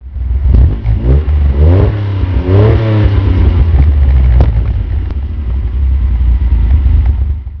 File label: Fiat Dino trot, classic car